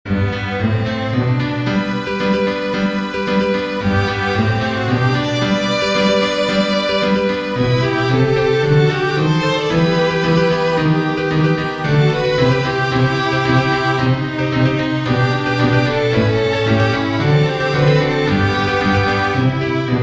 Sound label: blackbird